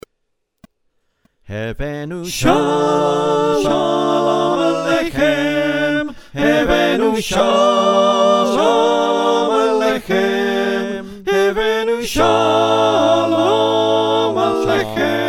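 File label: soprano alto tenor bass, choir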